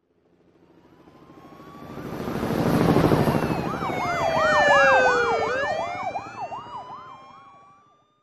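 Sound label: SWAT police helicopter and cars with siren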